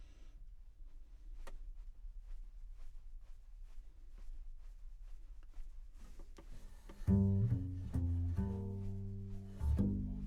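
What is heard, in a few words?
Contrabass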